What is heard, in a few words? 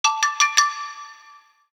Email Ritmico; Vertu Constellation T